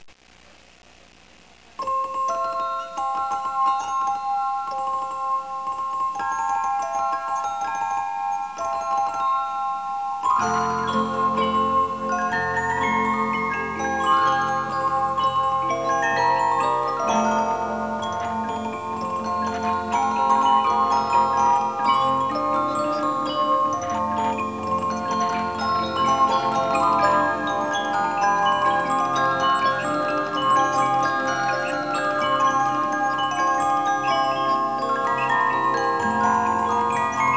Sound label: Carmen-Polka